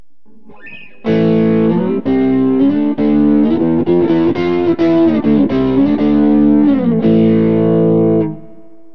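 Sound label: crossroads neck, guitar pickup; burny; fugly clips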